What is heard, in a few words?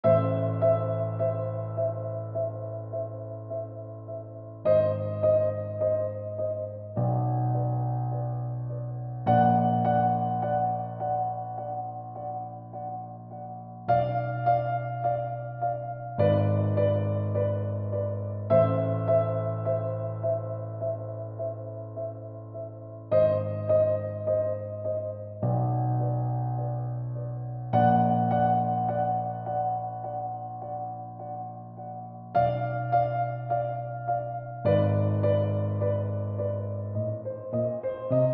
piano; 忘れていた記憶、回想。切ない悲しい静か。 暗く恐怖もあるピアノBGMです。